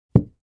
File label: Desk or service bell place down on desk